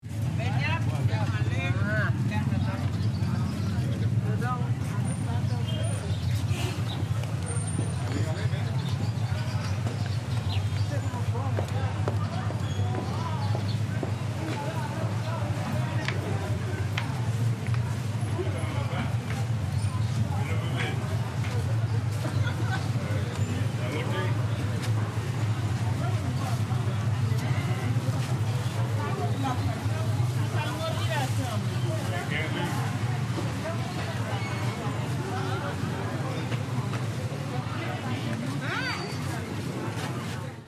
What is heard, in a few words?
Large fruit market with crowd Philippines